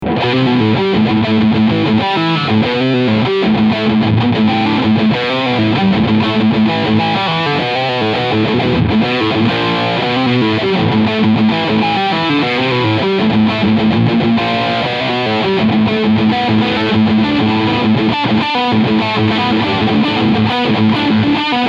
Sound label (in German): Chapman ML1 RS Riffsound Steg Humbucker; Rob Scallon